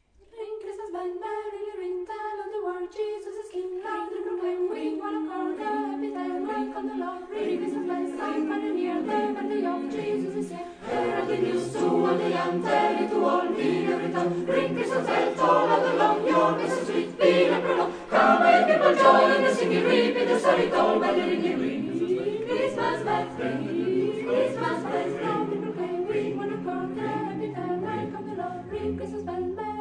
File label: Ring Christmas Bells